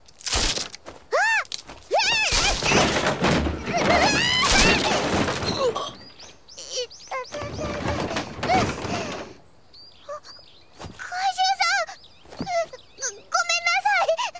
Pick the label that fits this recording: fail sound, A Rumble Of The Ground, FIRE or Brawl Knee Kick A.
fail sound